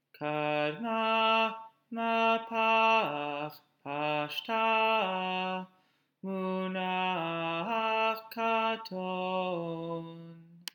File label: Torah Trop Pattern